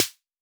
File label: snare 808